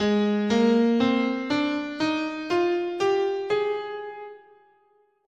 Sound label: Lydian, scale, guitar; Gsharp